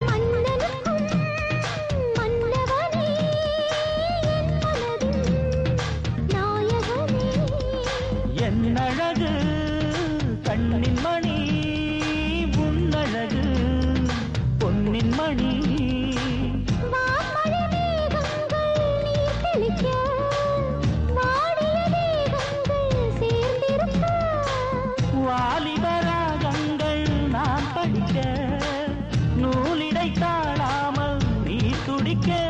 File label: Tamil Song